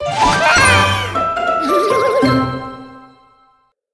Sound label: Crunchy Finish